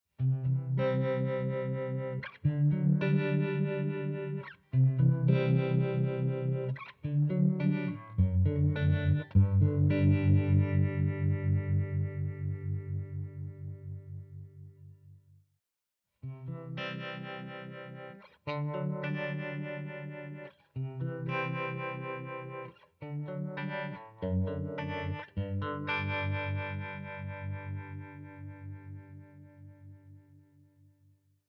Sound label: harmonic tremolo neck then bridge